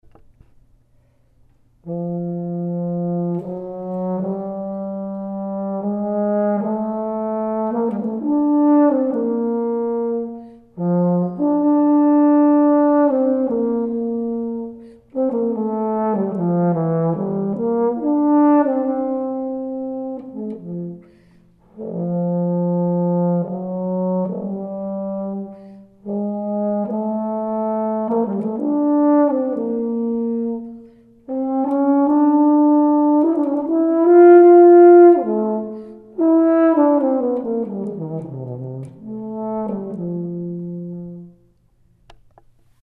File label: Euphonium; allstate